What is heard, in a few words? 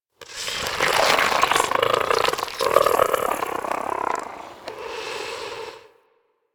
Landscape Plant Smoke Stack Creak; ambience; props; one offs; Warframe; Venus Open World